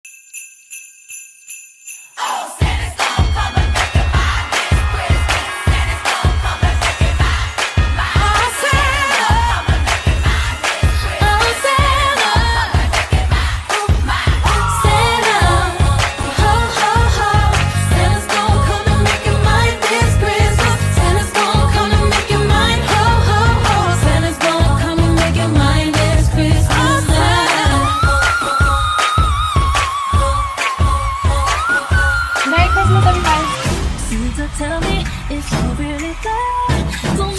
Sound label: christmas cheer mix; junior